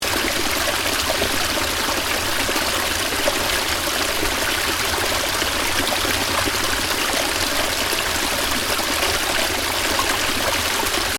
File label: Stream: heavy water running, loop; Nature; Water Sound Effects; Water (Sound Effects:Nature); Stuart Duffield